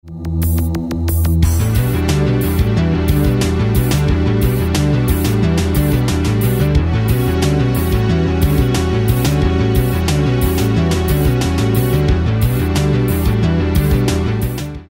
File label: smooth jazz jam